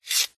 game leather skinning sound fantasy material action movement texture; ancient game